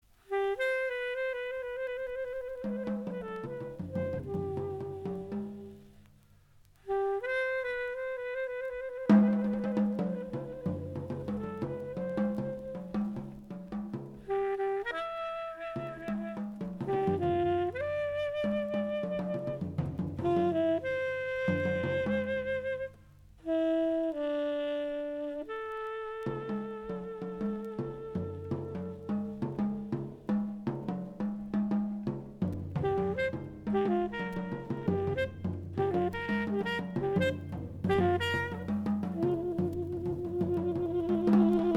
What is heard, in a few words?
TENOR SAXOPHONE